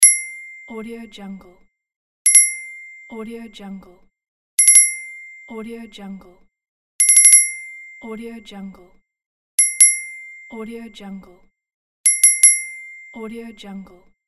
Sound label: dings; game sounds